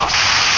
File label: slide